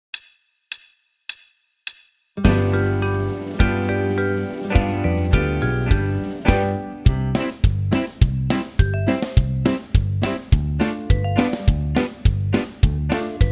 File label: polka